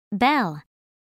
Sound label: bell